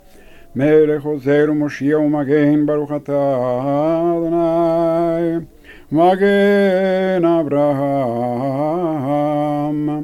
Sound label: Amida melekh ozer